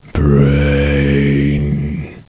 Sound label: zombie attack; svencoop; hunger; hungerzombiecop